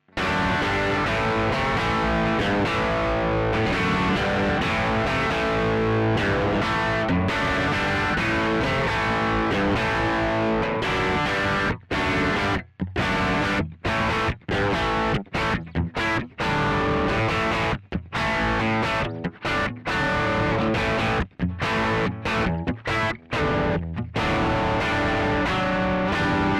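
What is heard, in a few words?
classic riff DI Balanced Out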